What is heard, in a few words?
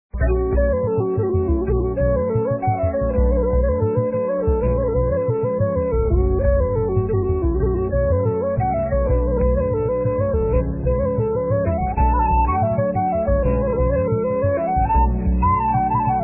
lofi